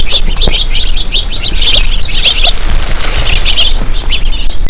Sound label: flock house finches